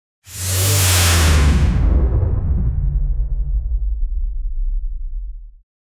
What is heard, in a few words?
cosmic destroyer flyby